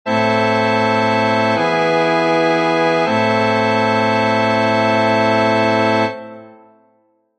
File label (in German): a-moll gis